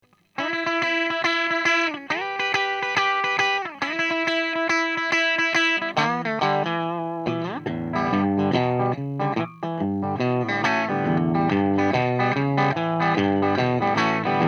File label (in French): P90 triode pentode crunch, Canal 1, P90 position bridge, EF86 en triode, puis pentode à fond; WJ Voxy; ampli White Jack, un 3 canaux